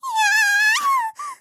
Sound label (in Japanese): いやあああん！, ロリ